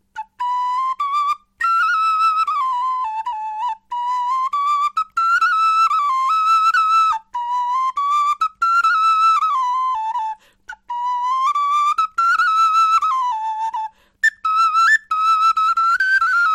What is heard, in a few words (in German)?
melodie, knochenfloeten